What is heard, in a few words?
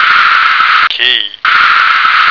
key